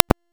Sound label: Hunter Bow Draw; Weapons; Enemy; Bows